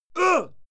male fight yell short

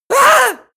male fearful scream high; schreie; menschen; soundpool

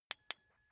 car key button click signal

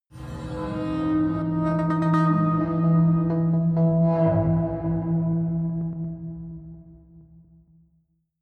soft metal squeak synth; drones

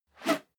whoosh whistle fast

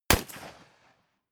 rifle ak-47 exterior fire single clean; comedy-cartoon; Pistols and guns; pistolen-gewehre

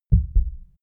heart beat double quiet

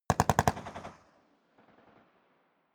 rifle ak-47 exterior fire burst distant; Firearms; schusswaffen

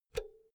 power cord pull out quick; objekte; elektronik

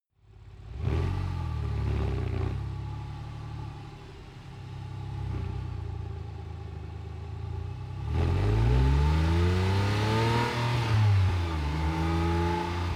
sports car int engine various sequences; verkehr transport; autos

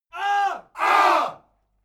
military shout ah

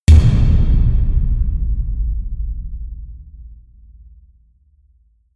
huge kicks, percussion

